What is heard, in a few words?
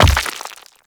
Brawler Rock Elemental Footstep Default D; Warframe; Warframes